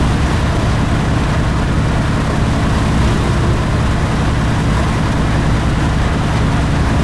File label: su 100 engine rpm 66, engines tanks; War Thunder